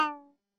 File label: spring bounce; Platformer